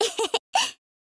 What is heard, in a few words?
ehehe, girl; voice